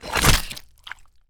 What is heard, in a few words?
meat slice